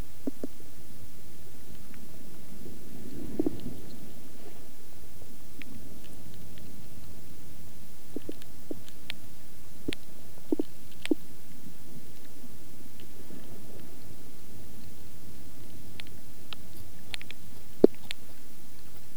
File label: Grunters Recording